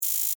Beep Buzzer Alarm, Scifi, Beep, Buzzer, Alarm, Short, Science, Fiction; Tech; SoundEffects-BlastWave